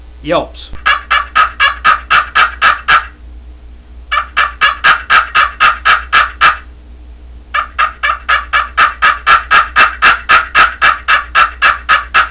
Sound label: pro push pin yelps, turkey; Quaker Boy; friction box